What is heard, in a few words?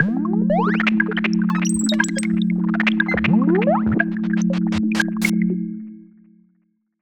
Calibration tone clean; iOS 26 Sounds